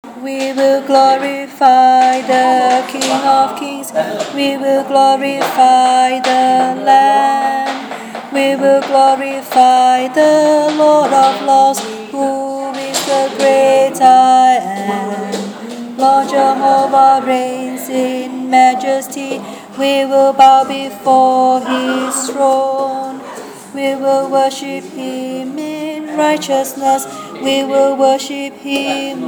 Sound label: alto